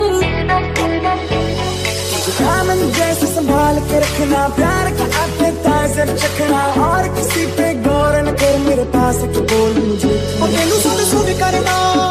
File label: hindi english mashup ringtone